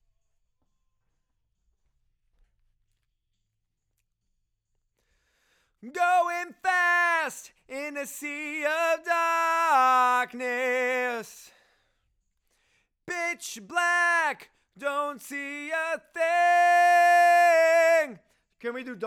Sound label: Chorus